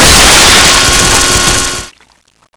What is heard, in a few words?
heli blade kill